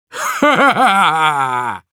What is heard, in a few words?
laugh happy, ru; Demoman